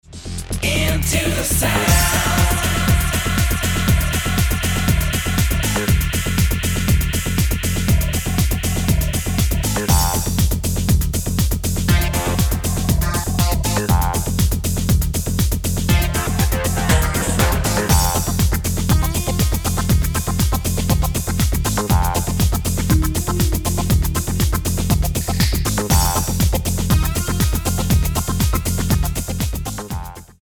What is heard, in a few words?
Electric Guitar Sunburst